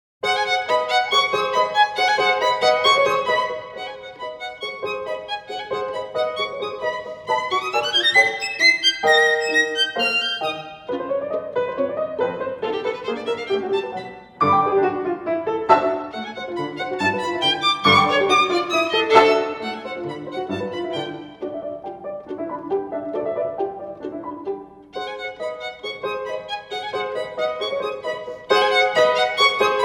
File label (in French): Sonatine Pour Violon et Piano - Vivace